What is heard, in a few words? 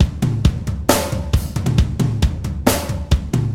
Drum Rock